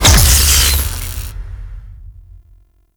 Fortress Electricity Turret Blast; Ambience; Gameplay; Warframe; Grineer

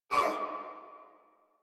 pain crewmen; Warframe; Corpus Crewmen; Crewman B

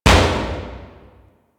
Destruction Short Metal Hits; Ambience; Warframe; Corpus Railjack

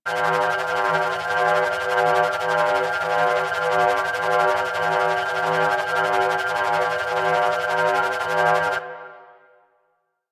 Osprey Shield Funnel Loop

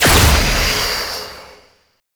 shrine dome start; Duviri

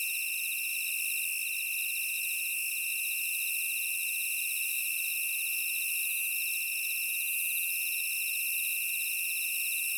Insects High; Ambience; Props; Warframe; Eidolon Plains

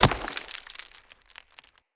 Throwing Knives Impact Rock; Weapon Impacts; Environment

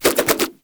nunchuck spin whoosh; Warframe; Infested; weapons

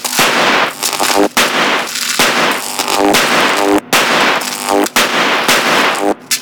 Tesla Coil Unstable Loop; Ambience; Warframe; Corpus Gas City; Gameplay Remaster

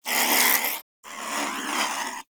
Vocal Snore, Critters; Characters; Warframe; Cryptilex; Hunting Critters

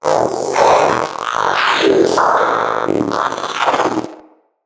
Companion Vocal Idle Growl, Pets; Zanuka

